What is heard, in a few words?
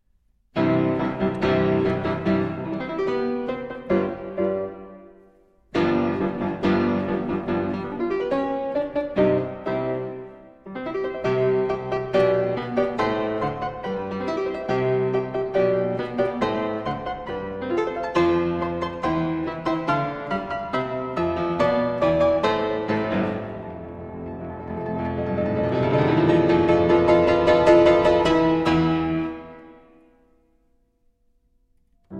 Fantasie in C Major